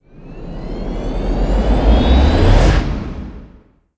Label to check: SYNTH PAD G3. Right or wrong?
Wrong